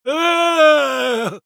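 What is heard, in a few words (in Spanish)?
Engineer pain critical death, es; *Grito de muerte 1*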